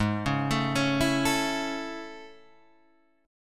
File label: A Major Ab; arpeggiated; JGuitar